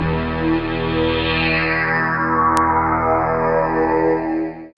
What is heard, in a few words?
PAD Dance; Best Service Gigapack 1